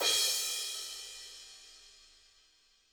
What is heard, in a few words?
HiHat & Crash, Roland TD-20; HiHats